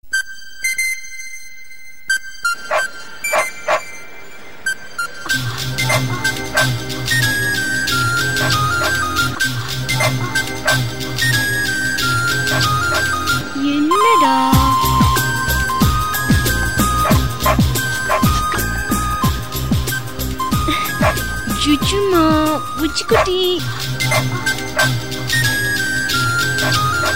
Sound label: Sms Flute Indian Ringtone